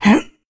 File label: jump, fox male